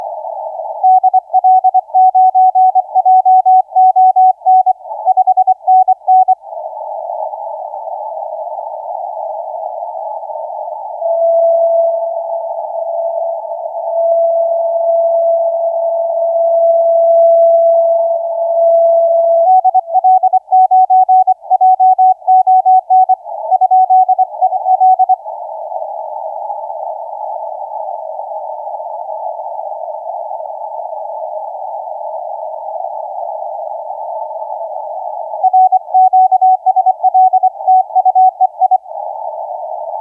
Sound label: 12m